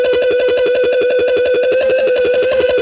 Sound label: Lap Steel Fuzz 170 B, guitar drone samples 170bpm